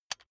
electric button drill direction switch backward heavy